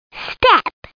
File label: Step